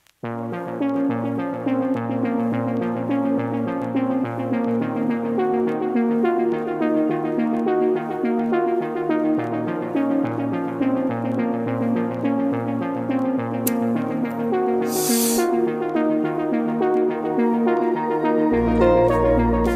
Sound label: deep house beat car music, усиление басов